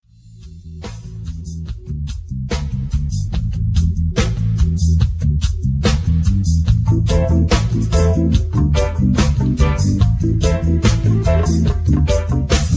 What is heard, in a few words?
latin reggae